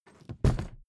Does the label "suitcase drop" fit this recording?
Yes